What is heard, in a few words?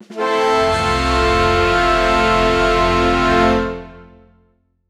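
cheerful and energetic, brazil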